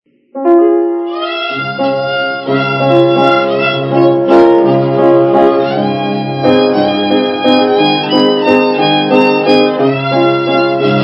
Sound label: Cattle Call Waltz